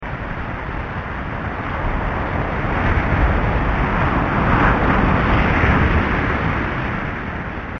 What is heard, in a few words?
Ocean